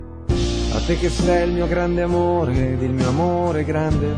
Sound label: Sms To You Italian Ringtone